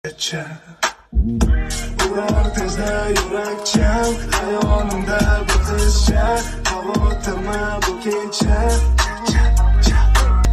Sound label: cha cha cha